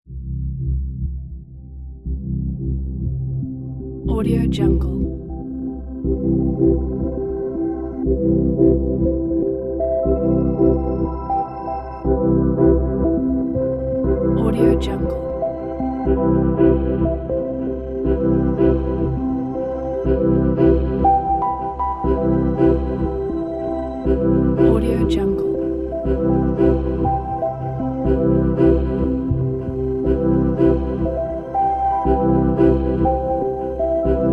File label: innovation ambience